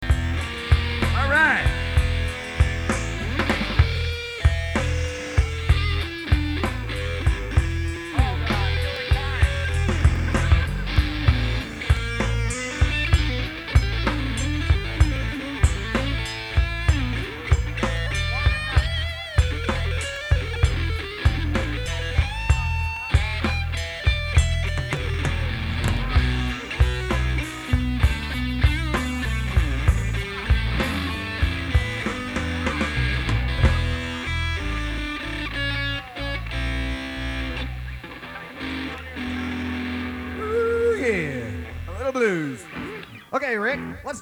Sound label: Short Blues Session